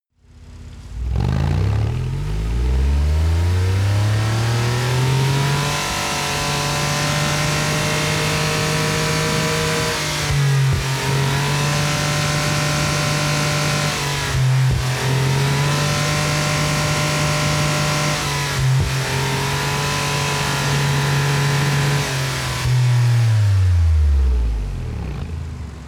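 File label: sports car exterior engine high rpm unstable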